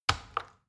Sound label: plastic button tiny click heavy slow